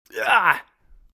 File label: hurt; RadwinIsland